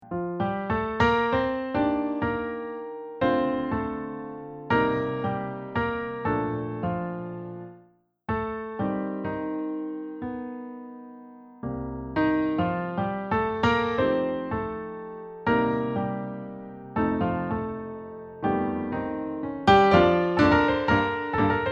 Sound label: accompaniment